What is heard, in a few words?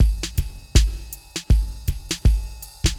Synth Loop, BPM 80